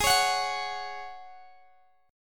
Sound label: Ab 7th Suspended 4th Ab down Guitar Open G